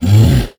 minotaur hit; Crypt of the NecroDancer

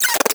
spider attack; Crypt of the NecroDancer

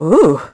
confused; Crypt of the NecroDancer

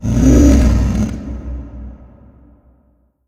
coralriff hit; Crypt of the NecroDancer

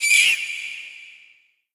wraith attack; Crypt of the NecroDancer

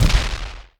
dragon walk; Crypt of the NecroDancer

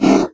ogre hit; Crypt of the NecroDancer

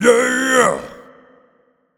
blues king attack; Crypt of the NecroDancer

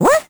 teleport; Crypt of the NecroDancer

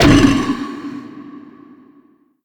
sarcophagus hit; Crypt of the NecroDancer

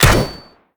rifle shot; Crypt of the NecroDancer

